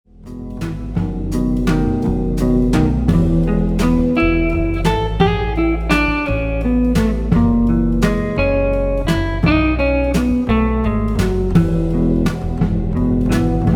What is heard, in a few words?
Anjas Lullaby